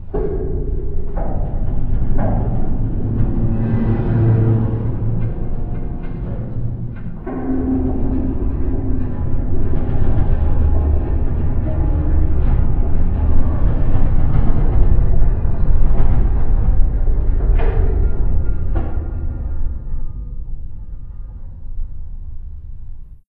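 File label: Metal Distant Groan Loop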